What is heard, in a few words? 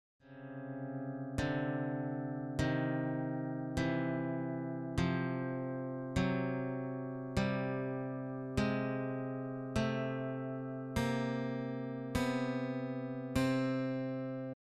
intervals practice, guitar